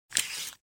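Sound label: drawn along something; Звук ножа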